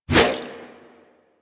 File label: Sword Swipe, Whooshes